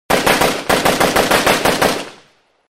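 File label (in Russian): strelba avtomata odinochnymi, assault rifle single shots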